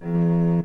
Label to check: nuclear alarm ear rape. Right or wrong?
Wrong